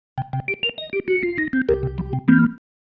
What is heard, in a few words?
Single Riff, Organ; Loops; Sonic Foundry; Joe Vitale Organ Donor